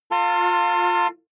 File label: car horn medium exterior hummer h3